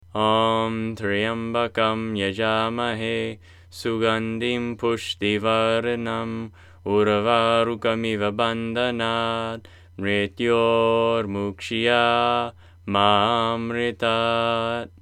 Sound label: Maha Mritunjaya Mantra, Guided Meditations